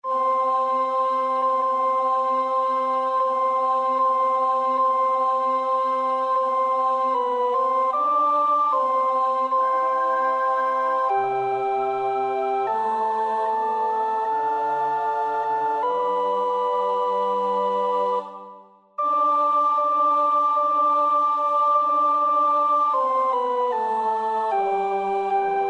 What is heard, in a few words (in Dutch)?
alt, koorpartij oefening; Gounod (Charles); Messe 6 aux carhedrale